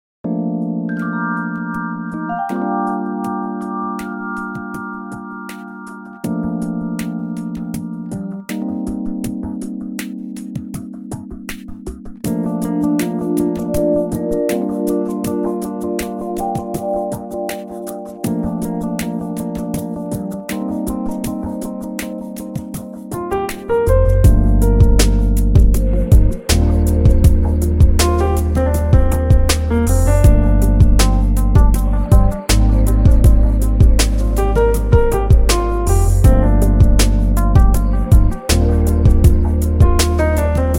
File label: Jazz